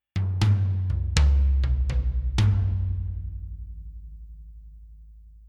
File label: Toms